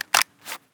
ump45 clip out; weapons; swb

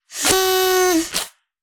party blower; taunt; terrytarget

